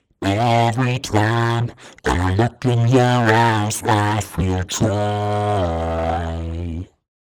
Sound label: Vocals Doom Vox; Richard Devine